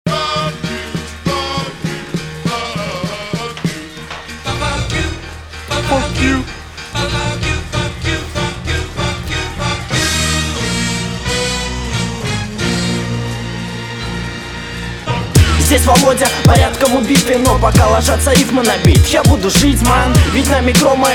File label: rap; Space Music Inc